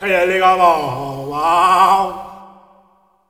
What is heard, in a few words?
MALE CRY, Heart of Africa; Spectrasonics; Heart of Africa 2; S.MALEAMB